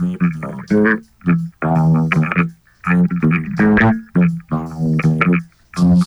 BASS, Funky Ass Loops; East West

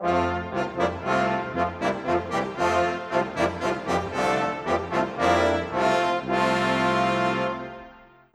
Brass; Sonic Foundry (Sony Creative Software); Orchestral VOL-2; Modern 31

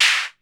Snare FX layer; Kick Snare Layers; Drum Bits; layering

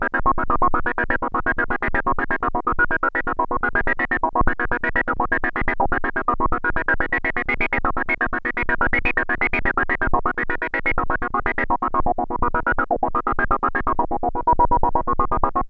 125, SYNTH SEQ; AMG; Global Trance Mission VOL-2

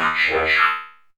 FX VOX; AMG - Vince Clarke Lucky Bastard

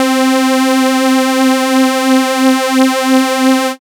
Synths Note C

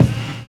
live kick; Univers Sons - Kitch 70s

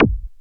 Perc Hit, Noise

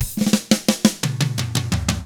116 SHUFFLED, Drums; ILIO; Double Platinum Drums 1

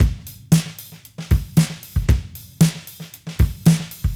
Cruisin' Disco Drums, 70s Analog Funk

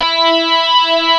ROCK E4, Classic Guitars; E-MU Formula 4000 Series Vol. 3 – Analog Odyssey

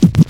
Scratch; Mo' Phatt; Digital Sound Factory

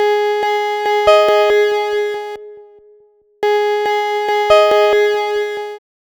Synth 140 G#; Vintage Cheese Lix

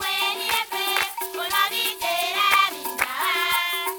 120 CHANT

